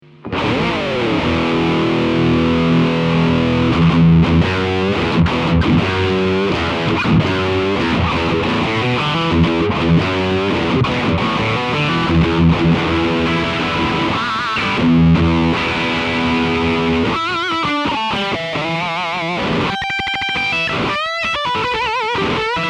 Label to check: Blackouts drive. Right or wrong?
Right